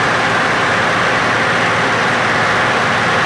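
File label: Plane P40 Interior Inflight Loop; Airplanes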